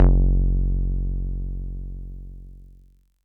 bass stabs; FutureRetro FR777

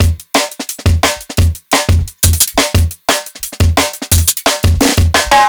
Live Jungle Drum Break, 175; JungleBreaks24

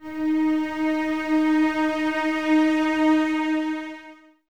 Cellos D#4 Expressivo; Miroslav Vitous; String Ensembles

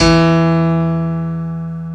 PNO, SY PIANO, House

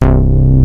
DANCE BASS, ENSONIQ VFX; Rubber Chicken Software Sample Bank

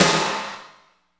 SNR, Tekno Industrial; East West

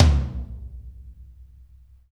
ROKTM LO; Drums In Your FACE!

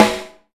RIM SHOT; E-MU Formula 4000 Series Vol. 5; Protozoa; Proteus2 Instrmt

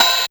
Rock Crash Casio SA-1

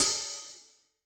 Rock Open, HiHat; Yamaha DTXpress-II

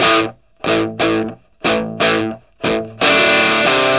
Vintage Blues Guitar, 60, A; USB Soundscan vol.22; AKAI

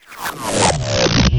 Downward swamp

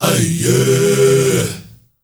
MALE, HUMMERS

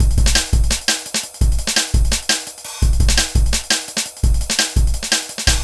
Jazz Break, Drum & Bass; Fluid Dynamics

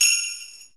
SLEIG, FM Perc; Rap

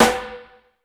TEK.S, TECHNO; 90 sSampleCDs; Crypton - DrumBase5000

